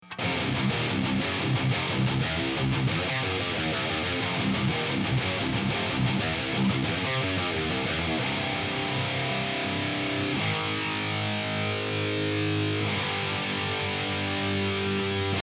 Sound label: mark iv; huge amp shootout